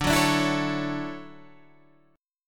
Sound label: D-Major 9th D